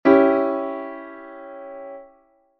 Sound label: C♯°, Chord Inversions